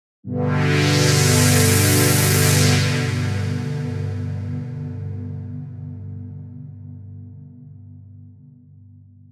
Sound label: Trance; jup8